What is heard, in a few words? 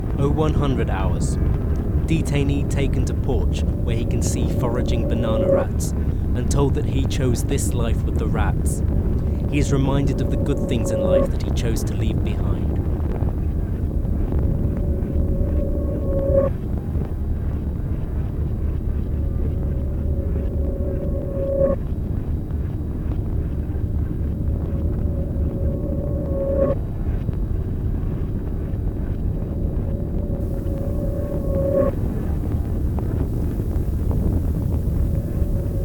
Whisper